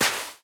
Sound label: sand walk, step; blocks; Minecraft; Remastered